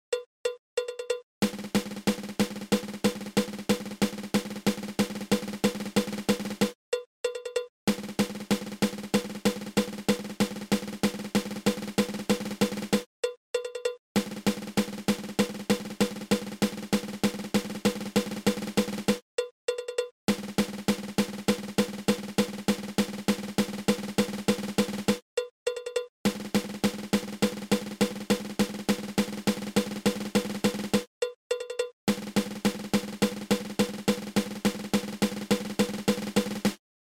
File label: Single Drag Tap triple